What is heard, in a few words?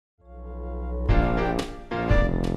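karaoke instrumental; christmas favorites; disney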